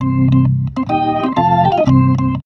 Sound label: B3 LICK C; B3 PLAYED